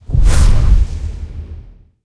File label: module fire, tanks; War Thunder